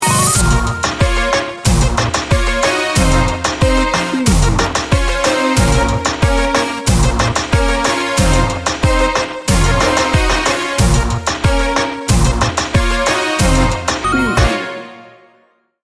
reggaeton seduction

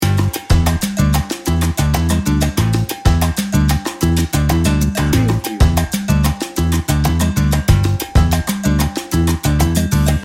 salsa spirit loop

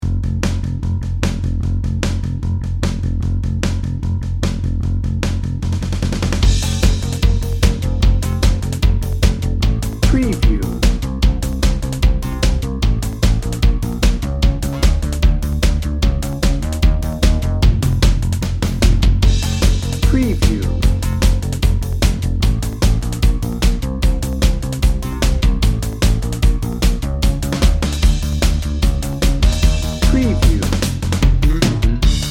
upbeat inspiration dnb

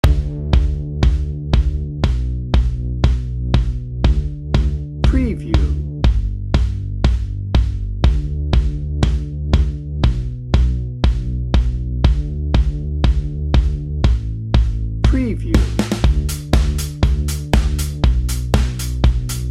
happy glow upbeat positive dnb

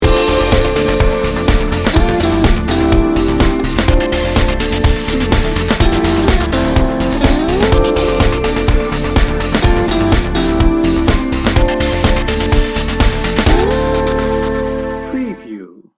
trance dance romance

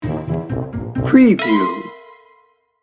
mischief and mayhem sting bumper

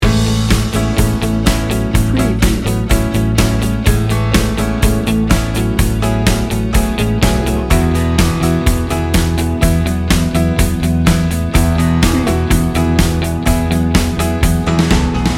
warm upbeat soft rock loop

